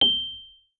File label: Rhodes MK1 G7; Xsample VOL-1